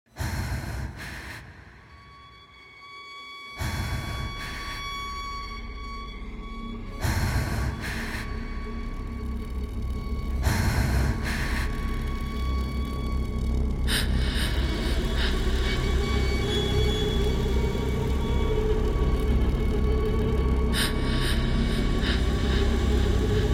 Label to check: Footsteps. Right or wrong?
Right